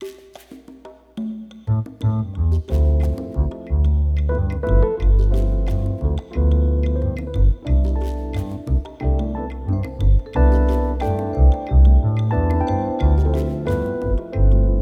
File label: latin jazz video track; Grimes Music Productions